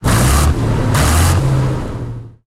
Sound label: su 100 engine load, tanks; War Thunder